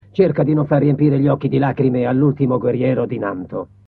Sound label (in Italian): violini